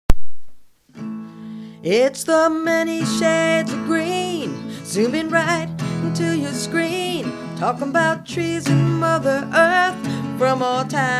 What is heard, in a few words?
song